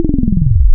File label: VV3320 Saw Res Sweep, wavetables; Animoog